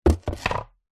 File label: sport skateboard set down on deck; zapsplat